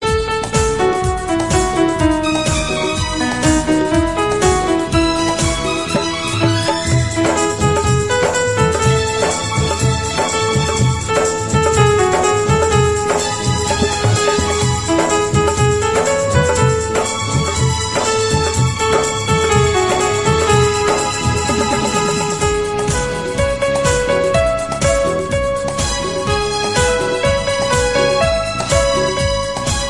Background Music Ringtone

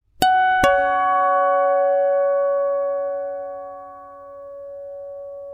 Pluck Ringtone; ringtones

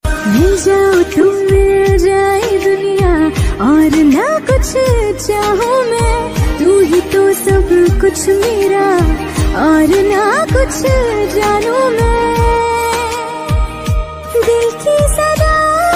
Female Ringtone

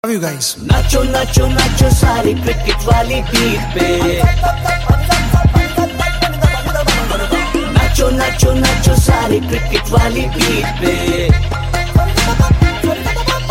Cricket wali Beat; ringtones; Best IPL Ringtone

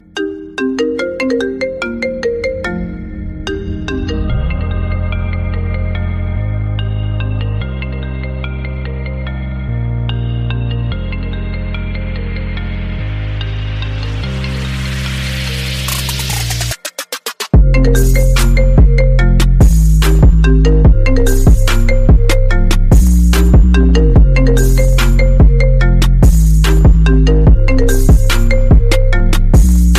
Ringtone; iPhone 8

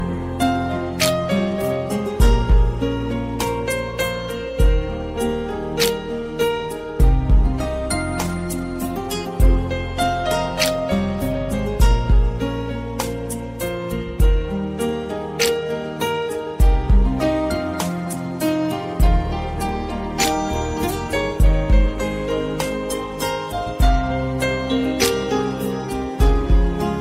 Romantic Instrumental Song Ringtone

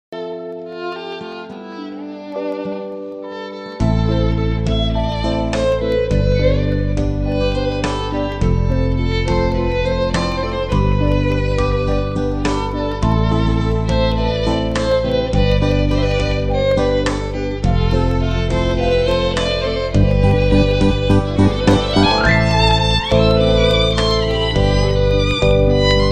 Ringtone Instrumental

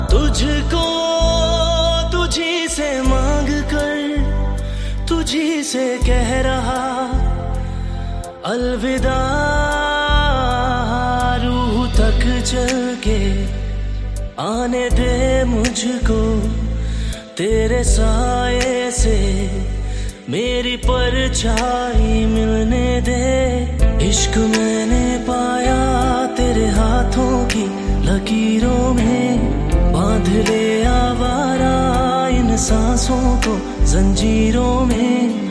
Romantic Hindi Song Ringtone

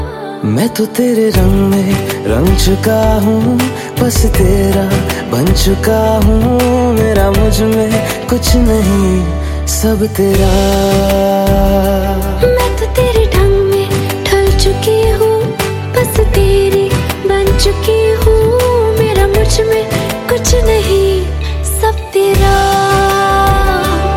Sad Song Ringtone

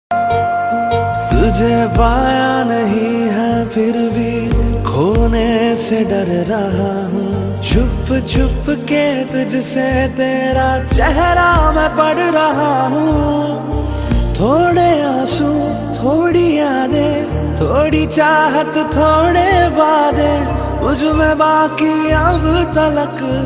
Hindi Love Song Ringtone

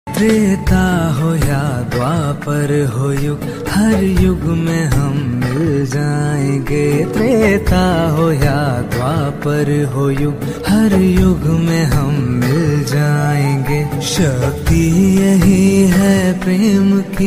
Radhe Krishna Bhajan Ringtone

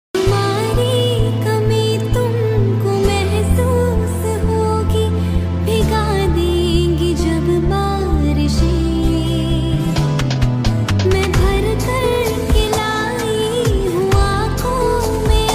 Ringtone In Female Version

